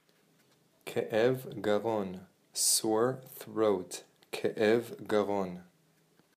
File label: Sore Throat